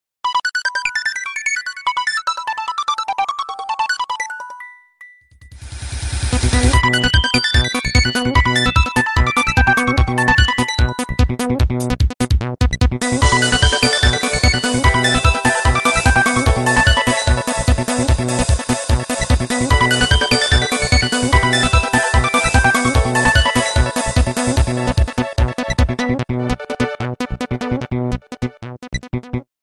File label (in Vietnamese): Nhac Chuong Nokia X30 Electric Eel